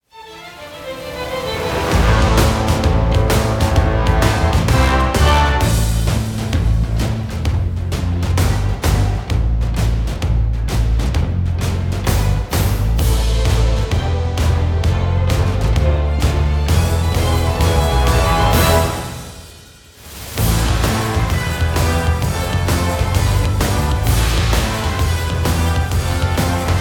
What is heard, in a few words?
Menu Music